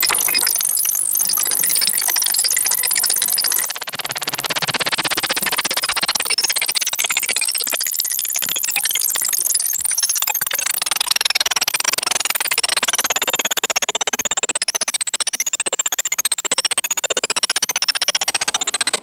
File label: rapid synthetic granular interface clicks; ui